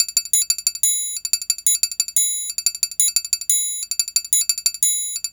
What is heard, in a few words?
Triangle Loop; Sonic Foundry (Sony Creative Software) - Fluid Dynamics Drum & Bass; Construction Kit; Percussion